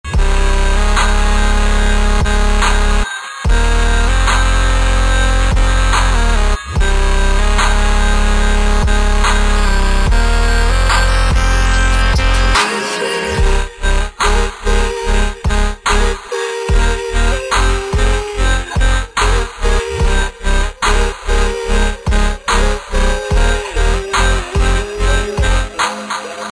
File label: With Tone